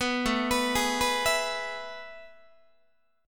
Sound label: A Major 7th Suspended 2nd, Guitar; AM7sus2 arpeggiated; 14,17,14,18,15,15; JGuitar